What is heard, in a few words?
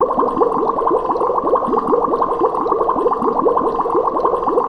bubble loop